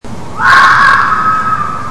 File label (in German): rotfuchs; erlenbruch